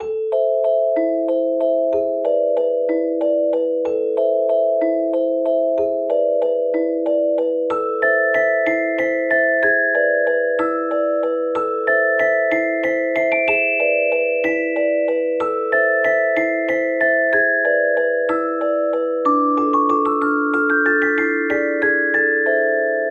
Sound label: sinyano warutu